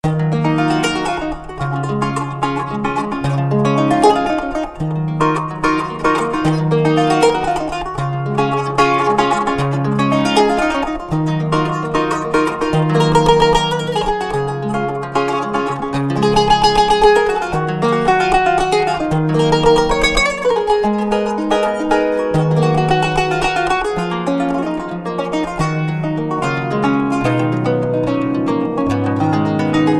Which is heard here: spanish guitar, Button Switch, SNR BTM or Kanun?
Kanun